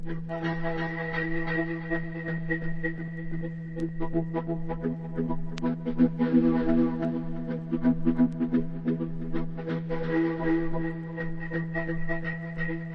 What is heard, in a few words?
acappella